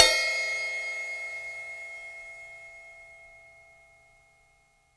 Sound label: huge crash cymbal one shot c sharp key